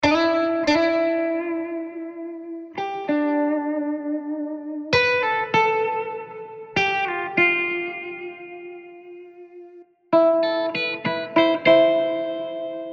Guitars Performance Palettes Am Pulses Phrases Ambience